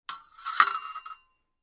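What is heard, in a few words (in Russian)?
ножны еще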